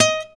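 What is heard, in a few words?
GUI PULL, acoustic guitar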